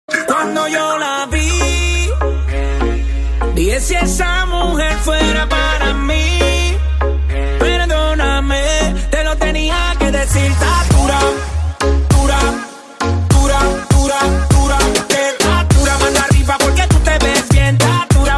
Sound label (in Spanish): mejor tono latino dura ringtone